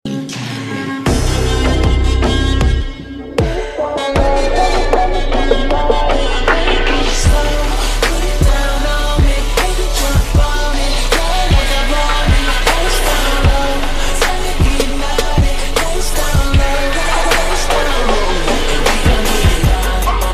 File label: English Song Mix Ringtone